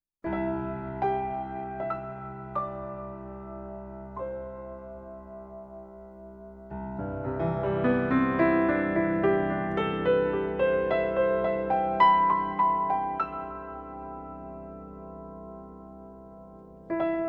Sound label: music babies